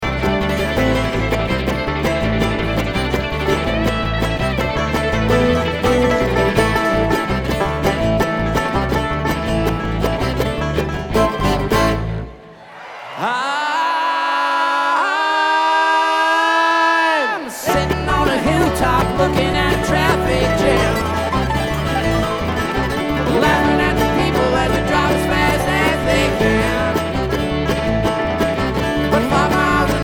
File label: Traffic Jam